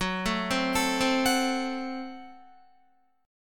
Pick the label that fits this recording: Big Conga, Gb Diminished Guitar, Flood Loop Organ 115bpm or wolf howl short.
Gb Diminished Guitar